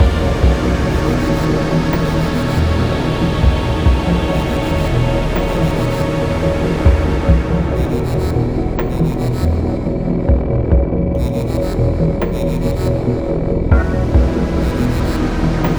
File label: Command Loop; Tinertia